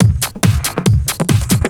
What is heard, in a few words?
Break Beats, 140 BPM